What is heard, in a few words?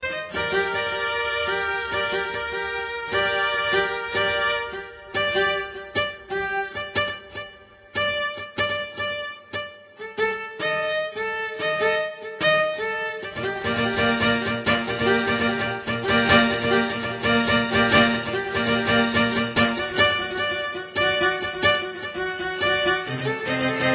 Classical Mood